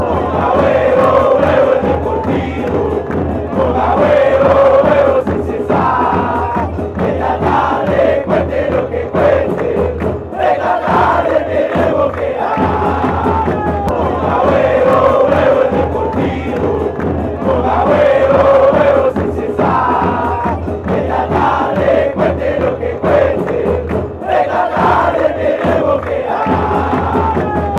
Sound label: chant, argentina; deportivo merlo